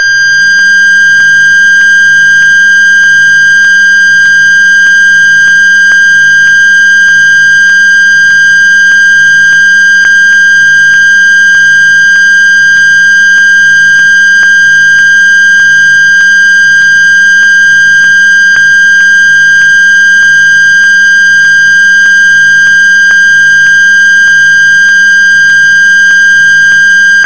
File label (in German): Pager Dauerton; Alarmton; Alarmtöne